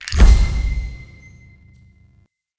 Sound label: UI Focus Rank Up Tree; DE; Warframe